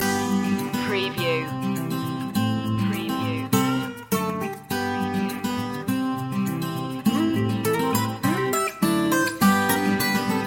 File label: our happy place loop